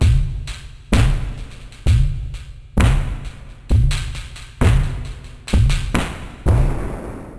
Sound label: Octave Down Loop 130BPM, DrumLoop Ghost Layers